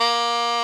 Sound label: SAX XSAX, Saxophones; All Saxes